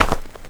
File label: Sand Thud; Blastmorph Pinball; xbox series xs